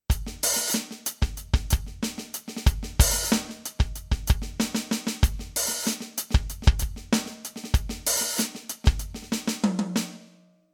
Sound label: Command Kit Groove